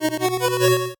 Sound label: 8bit se